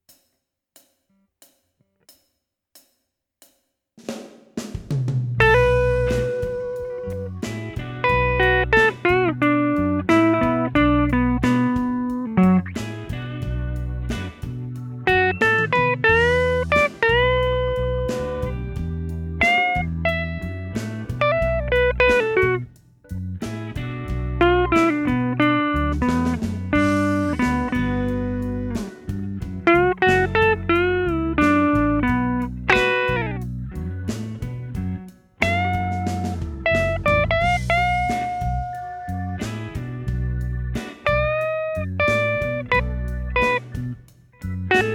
Solo

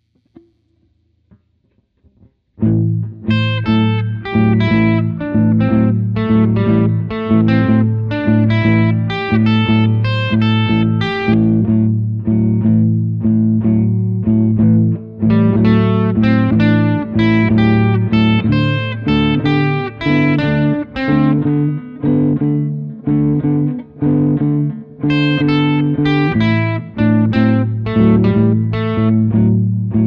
Simple Solo For Beginners

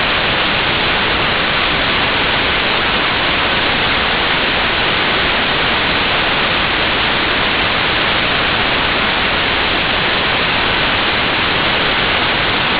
THOR Micro vs MFSK8 white noise

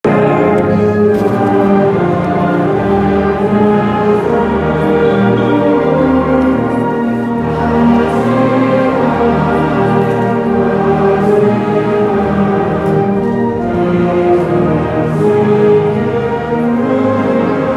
Band; Lions Band surprise performance of Kosciusko Alma Mater

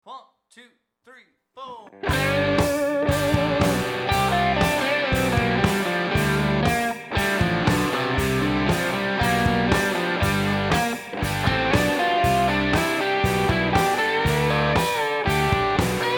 Solo

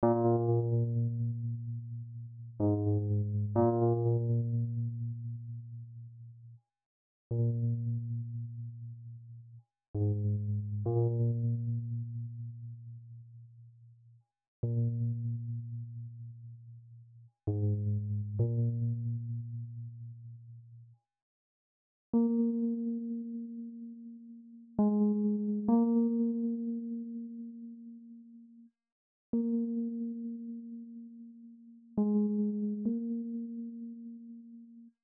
Classic Wurlitzer

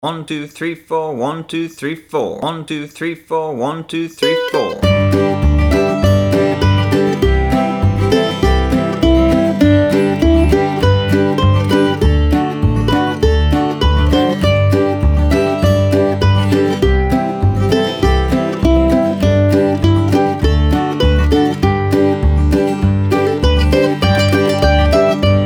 Beginner Mandolin 100 BPM; Bury Me Beneath The Willow

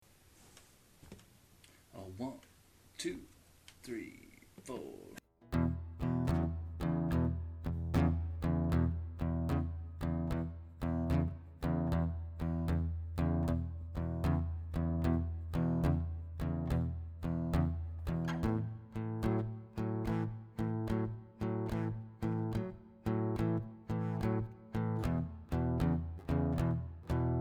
Backing (E); ABGU Almost Alone solo#1

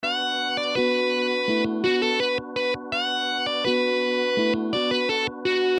Solo

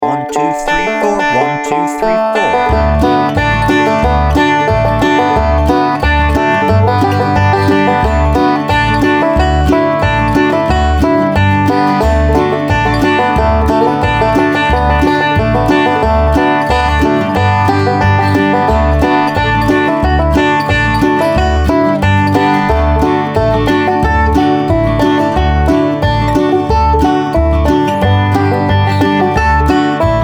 Angeline The Baker Intermediate Banjo 90 BPM

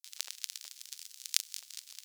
vintage bg noise looped

